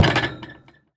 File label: Street Ball Glass Rim; SFX Kit; Street Basketball